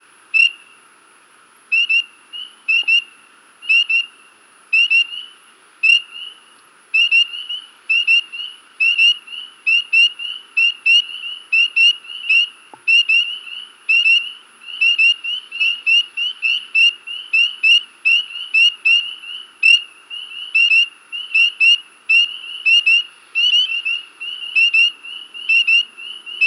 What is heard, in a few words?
Spring Peeper